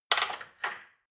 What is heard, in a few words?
dishwasher cutlery place